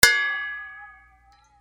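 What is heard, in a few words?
percussion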